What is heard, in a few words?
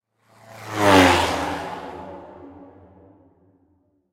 plane passby layer